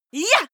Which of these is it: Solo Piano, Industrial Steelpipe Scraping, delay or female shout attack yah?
female shout attack yah